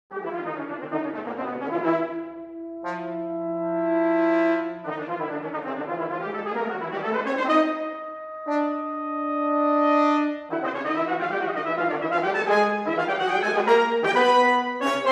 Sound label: Riffs